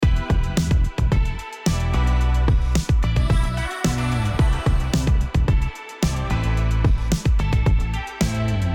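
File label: Drum low-pass filters Out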